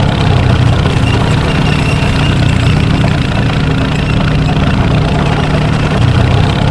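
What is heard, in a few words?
engine, boss tank; lmctf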